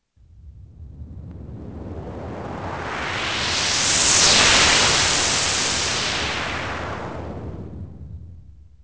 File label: stone; The Elder Scrolls III Morrowind GOTY; XBOX